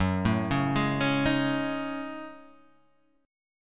Gb Minor 6th Add 9th Gb down Guitar Half Step Down